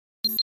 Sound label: Flash Beep LF Elect To, flash beep